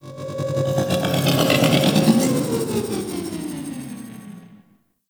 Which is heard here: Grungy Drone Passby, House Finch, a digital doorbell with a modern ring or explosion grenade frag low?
Grungy Drone Passby